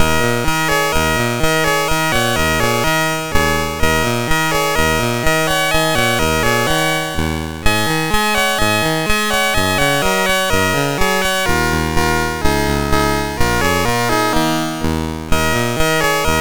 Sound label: sid MOS6581R2, GAMES; Changs Adventure